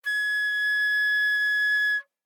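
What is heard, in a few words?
Flute non vibrato ff Ab6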